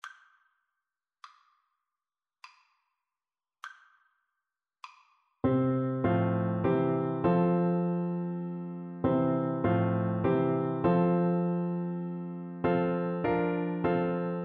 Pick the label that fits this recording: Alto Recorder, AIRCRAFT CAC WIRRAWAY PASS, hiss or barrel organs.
Alto Recorder